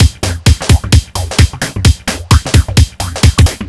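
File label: No snare, Drum Loops 130bpm; Zero-G; Soundclash Nu Dance Electro; Acidized Wavs; Chicago Love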